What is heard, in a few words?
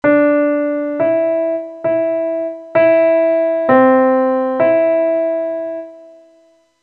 Melodic Dictation Pitches Only